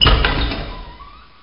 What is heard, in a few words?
DOOR OPEN; Blood: Fresh Supply